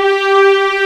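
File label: G4 legato F L, string; East West; The Ultimate String Collection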